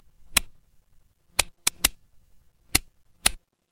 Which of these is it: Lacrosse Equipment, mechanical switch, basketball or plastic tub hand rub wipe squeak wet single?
mechanical switch